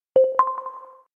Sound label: sign in sound; Xbox